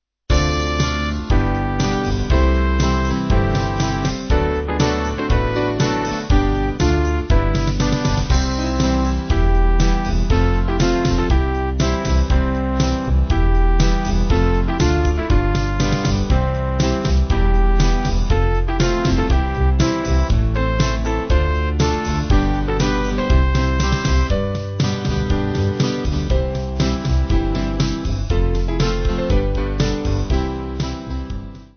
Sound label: Band; Come And Join; Collinson